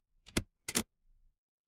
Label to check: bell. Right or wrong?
Wrong